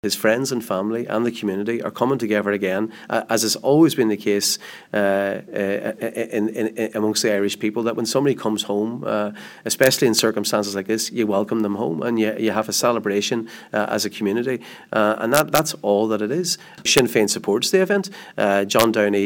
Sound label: pad